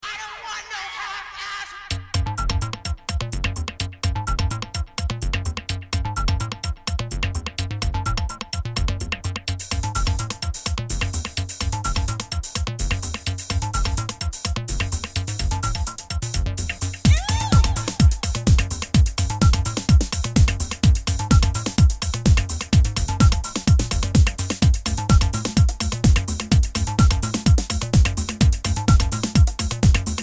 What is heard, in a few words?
Trance Version